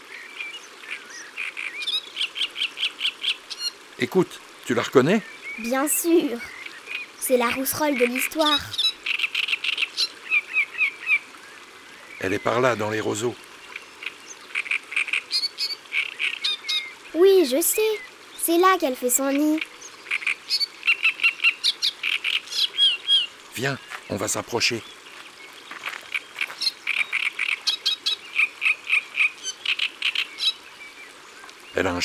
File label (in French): Une balade au bord de l'eau La rousserolle turdoide; Studio les Trois Becs